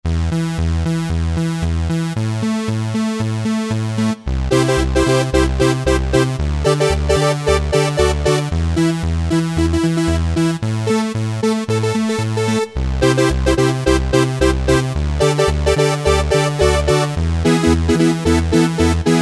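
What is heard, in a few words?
Double Saw, XG Voice examples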